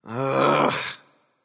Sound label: ugh; smite; voicepack; mercury